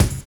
SERIAL BD; Univers Sons

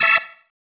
Stab, Organ, One Shots

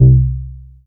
C3, BASS; Q Up Arts; Dream Experience; BASS BANK 6

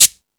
Percussion, E-MU Pro-Cussion

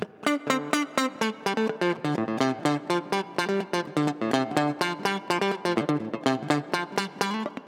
Guitar, 125bpm Dm, Nu Disco; Zero-G; Construction Kits; Speedy

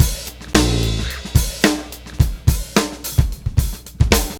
109 bpm, breaks; Zero-G Beneath the Planet of the Breaks

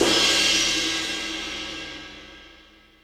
More Cymbals, Drum Machines

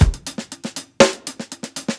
120 BROKEN 16TH HATS, DRUMS LOOPS; BASEMENT DRUMS VOL 2

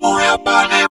TALKBOX

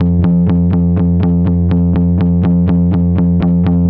Bass, Trip-Hop Loops; Sonic Foundry; Rondo Brothers Trip-Hop Loops & Samples

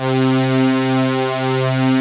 OBX PADS; Pro-Rec - Synthsonic; Z1 PADS

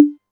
808 Conga Mid, Novation Drumstation; Novation DrumstationV1

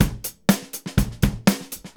123 groove; AMG Gota Yashiki Groove Activator

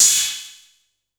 C#3 - Analog Cymbal, Yamaha PSR-E323 Dance Kit

